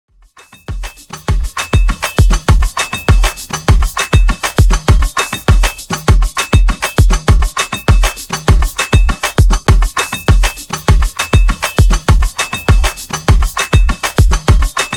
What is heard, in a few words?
Redrum 100 Bpm